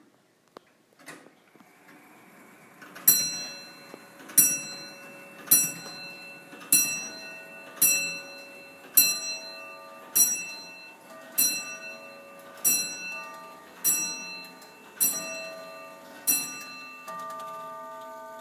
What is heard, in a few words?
Charleston noontime bells